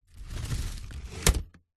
foley basket hamper picnic set down on hard surface; zapsplat